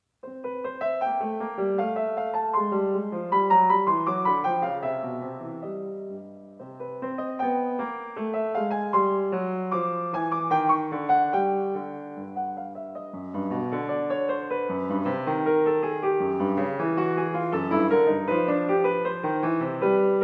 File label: short piano pieces; Ross, Clark